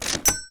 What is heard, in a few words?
coin click